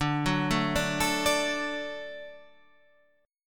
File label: D Major D down Guitar Standard; D arpeggiated; JGuitar